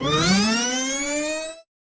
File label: magical whoosh or energy